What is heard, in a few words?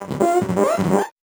VocoBit Noise, 8-bit; musicradar; 8-bit bonanza